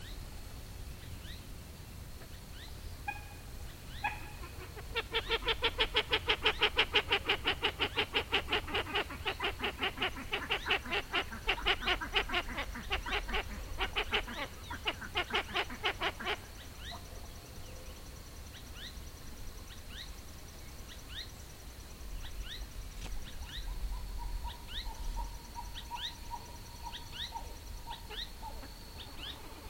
macagrueso, Macá Pico Grueso, Podilymbus podiceps, Pied-billed Grebe; cantos; PODICIPEDIFORMES; Podicipedidae

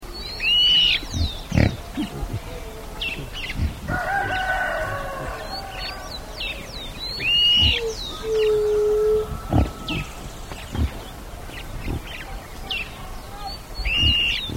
Fiofío Pico Corto, Elaenia Parvisrostris, Small-billed Elaenia; cantos

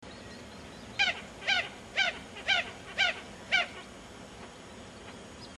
galla liga roja; cantos

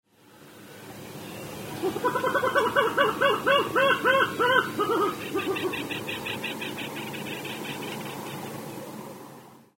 gallaescurojo; cantos

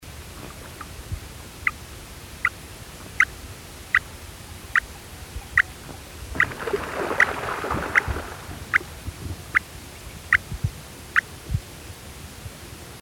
macaplateado, Macá Plateado, Podiceps occipitalis, Silvery Grebe; cantos

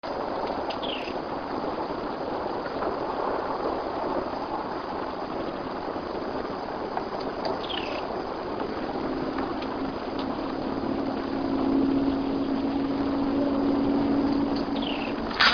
Mosqueta Ojo Dorado, Todirostrum margaritaceiventer, Pearly-vented Tody-tyrant; cantos

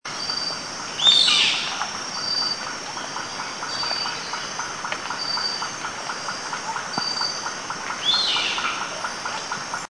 chimachima; cantos

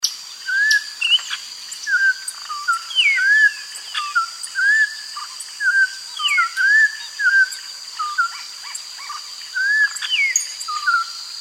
matico

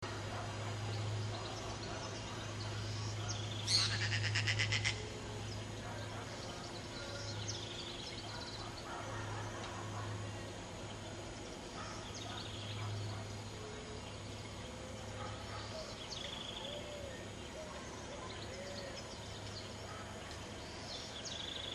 caracolero; cantos

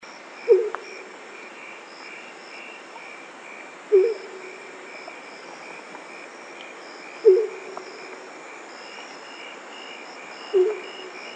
Ñacurutú, Bubo virginianus, Great horned Owl; cantos; STRIGIFORMES; Strigidae

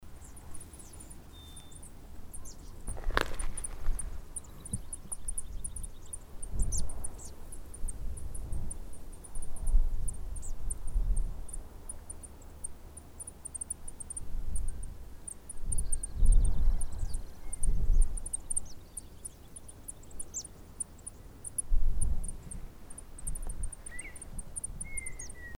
cachi trinadora, cantos, aves

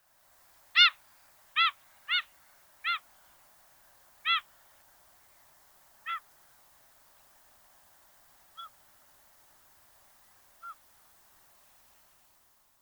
gaviota cangrejera, Larus Atlanticus, Olrog s Gull; cantos

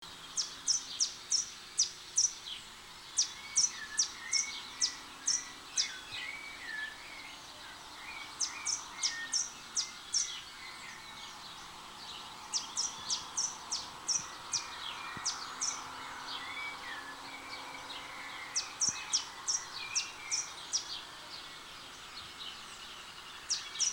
naranjero, Thraupis bonariensis, Blue and yellow Tanager

